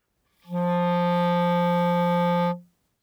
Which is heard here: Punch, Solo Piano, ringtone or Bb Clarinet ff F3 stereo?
Bb Clarinet ff F3 stereo